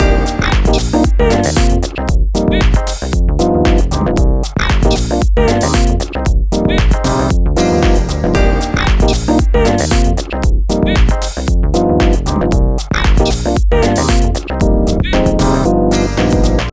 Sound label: Frisky Bouncy Bendy; Sony; Xperia Movie Creator Music